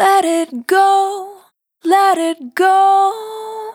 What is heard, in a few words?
Dry, Single Voice Melodic Loops, Vocal Samples; Sony Creative Software; Pocket Diva World Class Vocal Samples